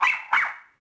Dog Bark; Project Gotham Racing 2

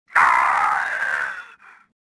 choke; Star Wars Jedi Knight II Jedi Outcast; XBOX; shadow

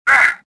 land; worker3

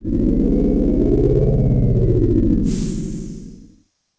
elevator start; Star Wars Obi-Wan; XBOX

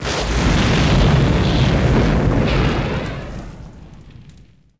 explo fireball; impacts explos

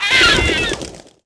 hit seagulls; animal; Tony Hawk's Project 8; XBOX

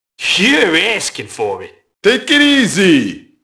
mobster group noise; Max Payne

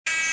ammo empty; Star Wars Jedi Knight II Jedi Outcast; XBOX

